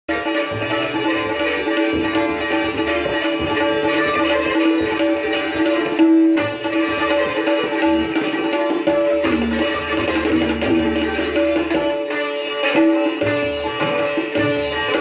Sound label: Sitar